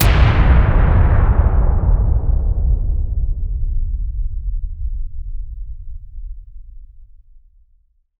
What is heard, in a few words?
BLIP BLAM, WARHEADS; Spectrasonic Distorted Reality 1